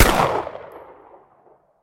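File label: rifle sniper single shot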